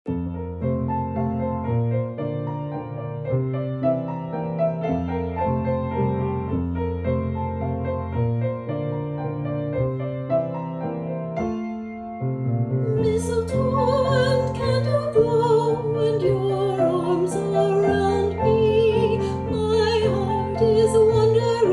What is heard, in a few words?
Waltz Flute softer; Mistletoe